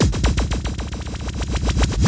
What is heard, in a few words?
heavy back and forth fx, 115 bpm, drum loops and breaks